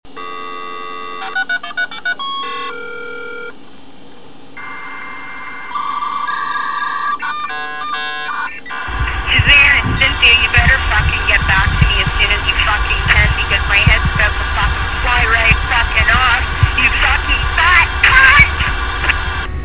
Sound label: modem